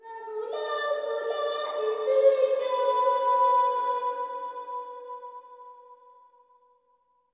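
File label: LITTLE GIRL, AFR. GIRL; Spectrasonics; Heart of Africa 2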